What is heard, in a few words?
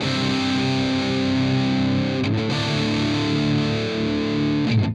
Soaring Chords, Padding, Guitars; ACID MUSIC